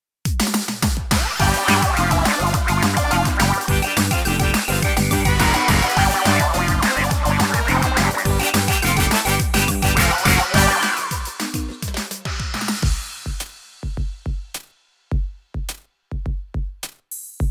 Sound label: Victory; Team Big Man, Phase 1